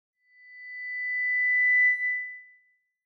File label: Microphone Feedback